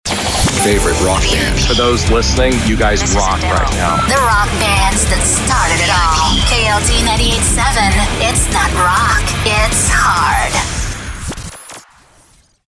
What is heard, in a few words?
ROCK Radio